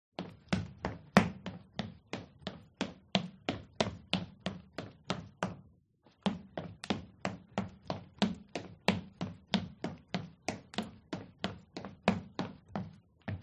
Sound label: footsteps